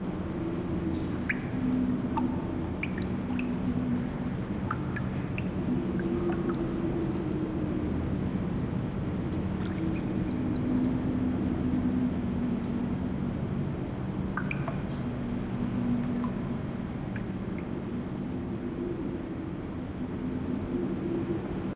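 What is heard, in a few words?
cave ambience; theGoonies; Retro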